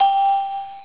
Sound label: ding